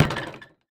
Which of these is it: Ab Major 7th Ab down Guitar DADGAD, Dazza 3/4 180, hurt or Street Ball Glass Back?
Street Ball Glass Back